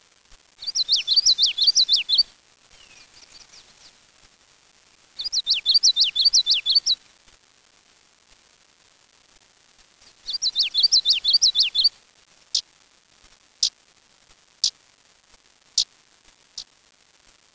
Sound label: common yellowthroat, chants